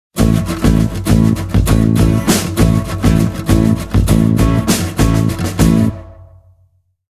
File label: Dance; Fortnite Save the World; SFX Outlander